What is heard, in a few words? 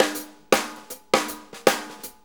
Shuffle Fill, Drum Tools